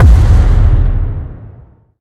Footstep; Minecraft Story Mode; Colossus

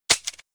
Vending machine Click

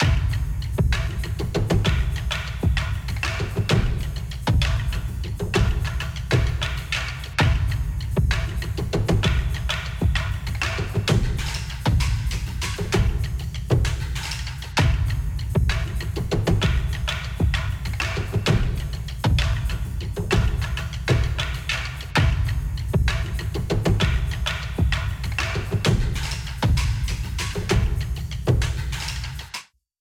Mus Action Perc Low 130; moons of madness

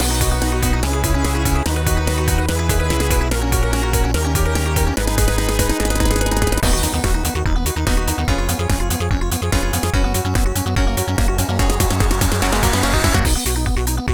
Para-Para Stage Karaoke; pinky street kira kira music night pop town gamerip

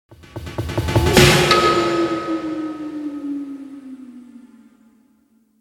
jungle stinger; Men of Valor

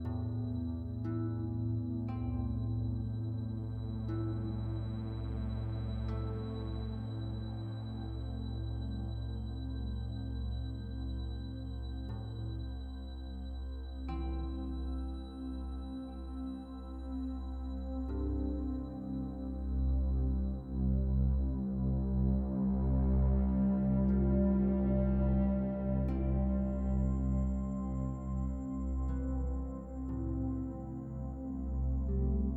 Loop Neutral

